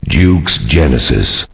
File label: stab; genesis; sven